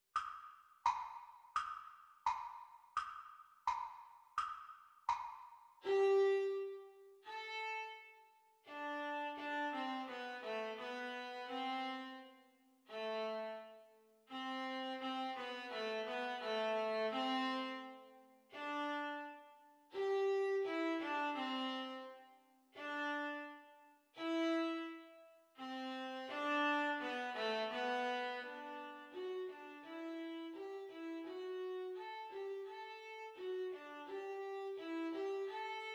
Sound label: Viola Duet Playalong Exam Speed